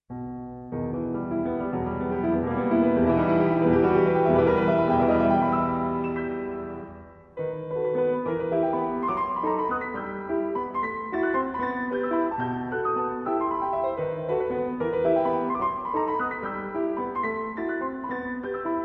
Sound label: Valse